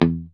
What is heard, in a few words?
STRAT, Classic Guitars; E-MU Formula 4000 Series Vol. 3 – Analog Odyssey